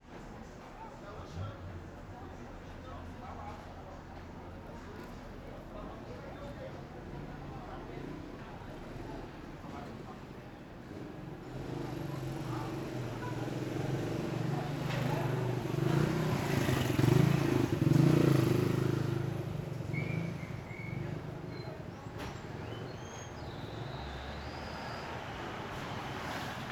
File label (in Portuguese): Oficina mecanica sons metalicos vozerio moto estacionando serra mangueira; agua; ambiencia urbana